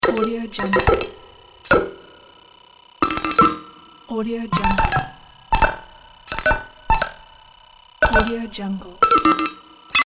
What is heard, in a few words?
robot typewriter effect